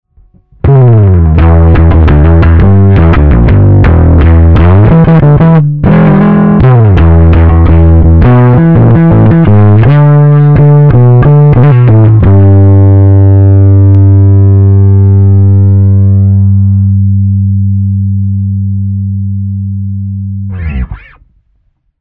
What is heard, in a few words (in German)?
Kompressor Bass DI